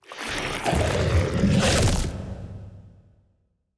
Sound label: engulf; weapons; cutholoids; Stellaris; grand archive